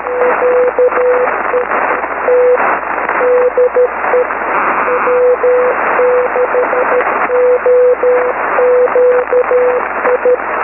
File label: 80m, arrl fmt; arrl fmt april 2010